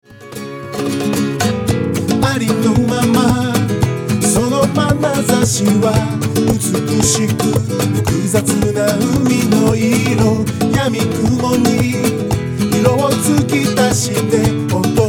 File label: flamenco